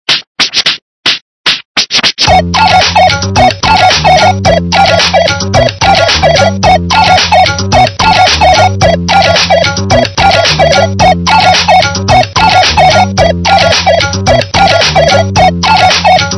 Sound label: moto